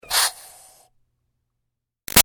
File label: Steam Release Iron Chuff Tight; Miscellaneous Household Sound Effects; Big Room Sound